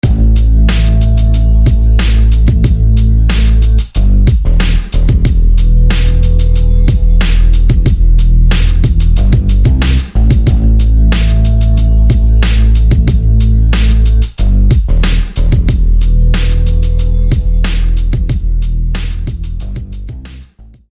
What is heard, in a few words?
Singing Bass; SFB FX